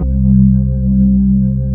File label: B3 HAM, Keyboards Old School